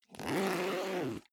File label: zipper backpack open